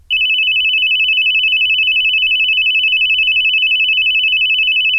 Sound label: piezo fast